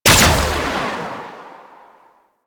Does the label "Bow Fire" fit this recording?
Yes